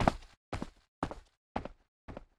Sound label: Walk Go One